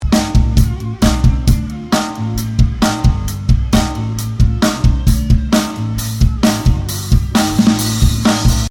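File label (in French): snare, tous les traitement, les Overhead ouvert et évidemment aussi la grosse caisse; batterie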